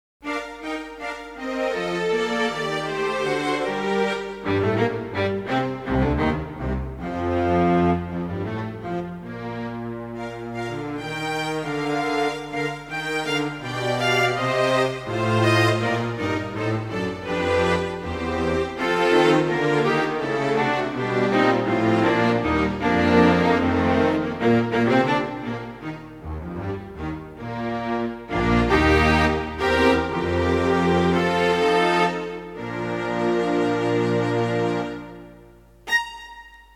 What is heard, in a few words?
strings